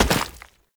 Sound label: Step Rock, Foley; Warframe; Oraxia